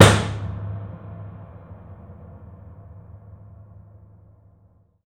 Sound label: SLAM LID; InVision Interactive